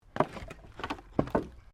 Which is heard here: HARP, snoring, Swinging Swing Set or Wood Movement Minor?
Wood Movement Minor